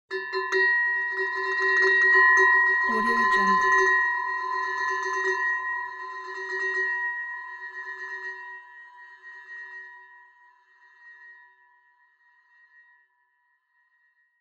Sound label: zen sfx